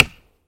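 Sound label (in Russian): удар деревянной палкой об палку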